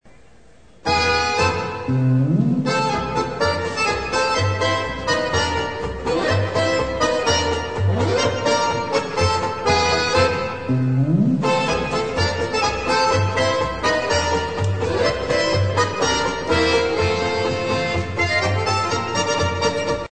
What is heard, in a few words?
POLKA